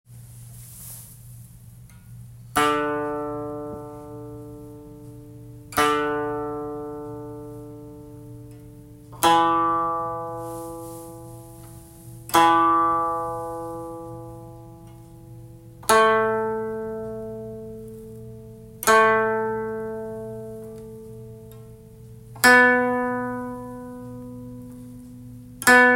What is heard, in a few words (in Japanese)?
箏 調弦; D E A B D E G A B D E A B